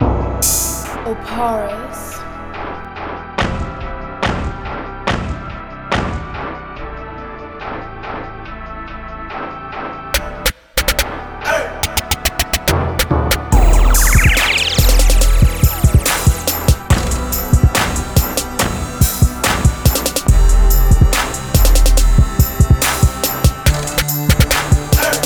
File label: 71BPM